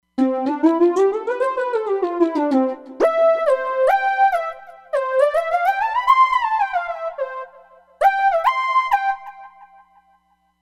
Vari-D Solo, Roland Fantom XR

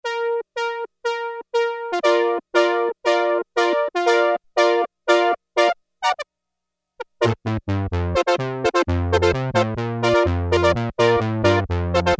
Brass, Roland Juno-60; Factory presets

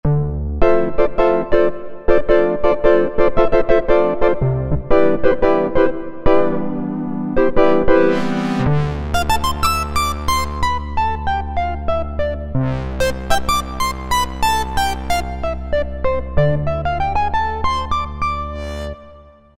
Wave Mix, Cheetah MS6

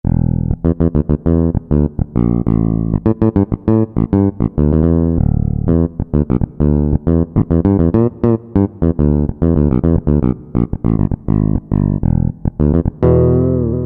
Fingered, Roland U-220; Factory Timbres

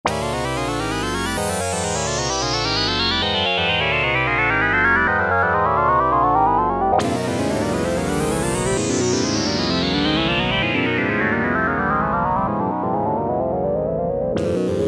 QUANTIZED LFO, Oberheim OB-8; Factory Patches

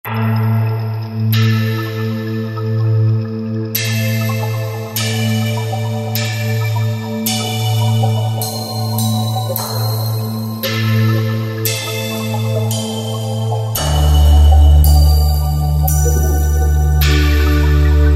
Aqueous Strings, Roland JD-990; Factory Patches

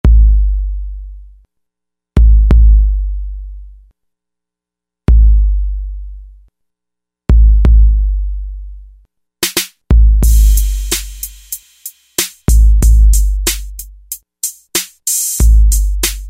TR-808 bass drum

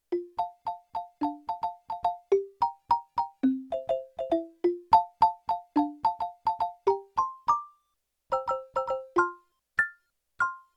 Short Celesta, Yamaha TX16W

